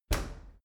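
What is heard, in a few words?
dry cleaner open soft, maschinen türen; tueren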